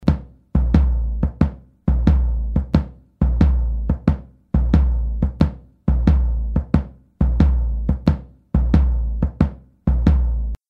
surdo; lateinamerika

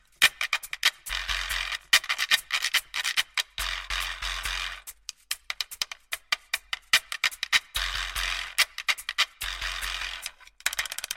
quijada; lateinamerika

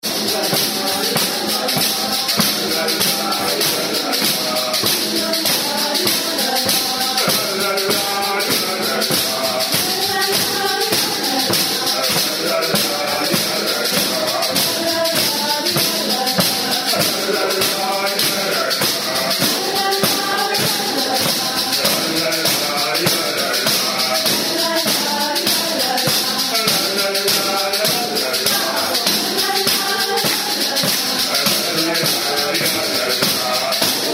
call and response

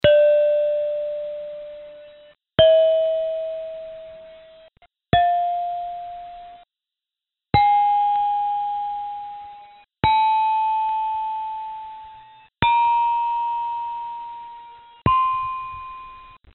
pelog, gamelan

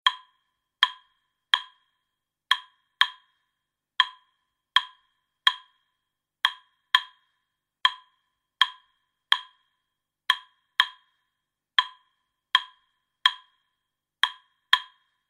claves; lateinamerika

